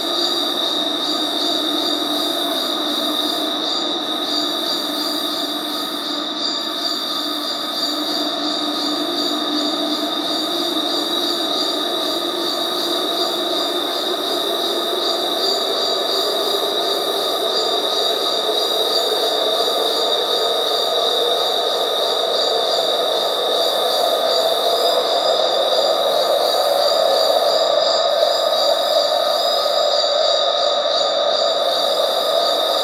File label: greenhouse, ambience; The Swapper